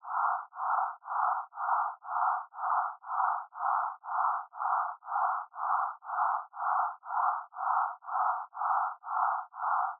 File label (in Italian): Rosa filtrato 1kHz modulato 2Hz